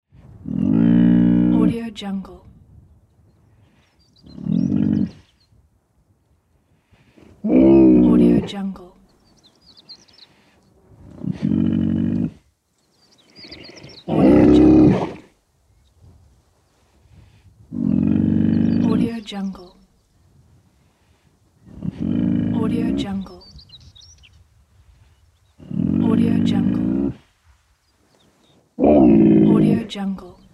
camel; camel vol 2

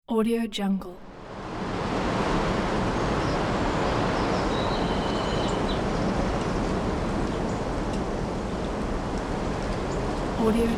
mountains day ambience; premiersound

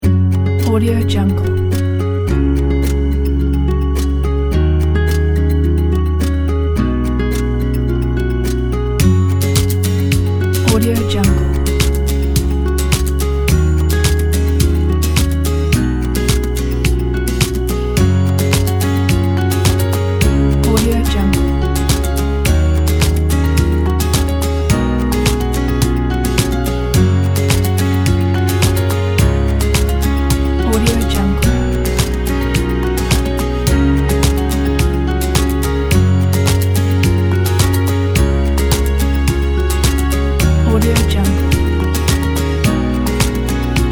uplifting inspiring

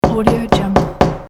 knocking on a door

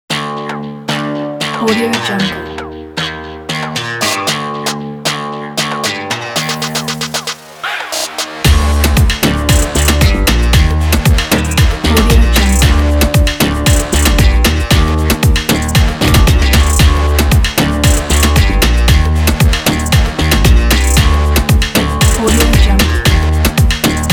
urban reggaeton

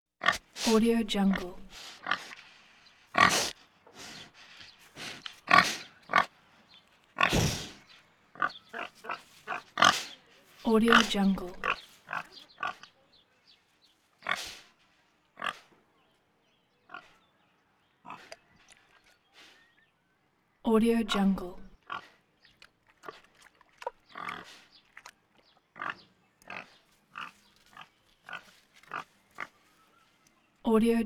hog grunt

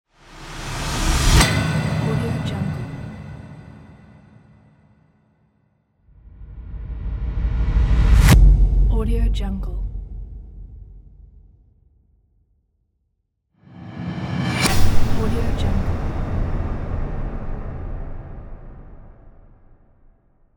cinematic impact transitions; n-audio

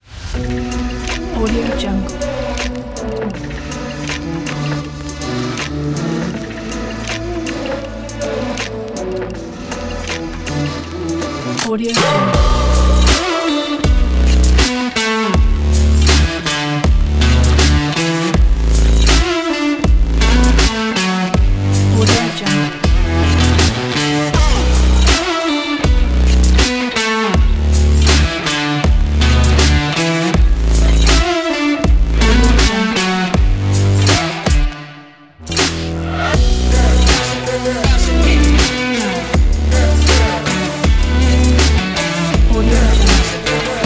urban guitar and blues; loopslab

